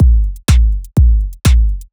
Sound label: Strip 124bpm, House Drumloops